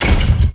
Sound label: elevator stop